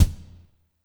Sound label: Snare One Shot, Drums